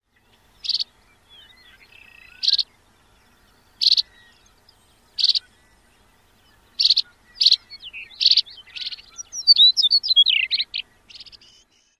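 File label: Chestnut-collared Longspur calls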